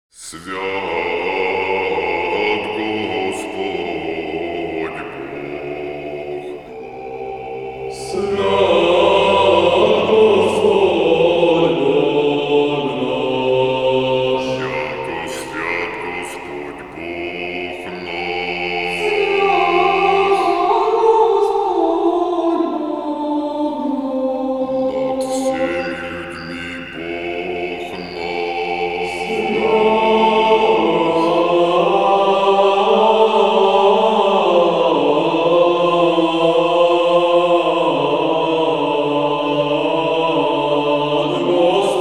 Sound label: glas 1; harkov; Svjat Gospod Bog nash